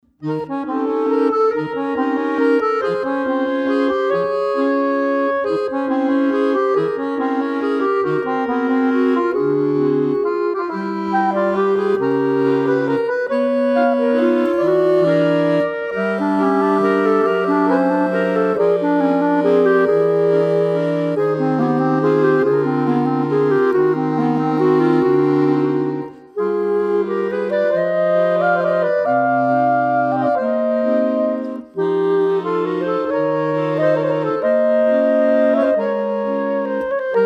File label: Waltz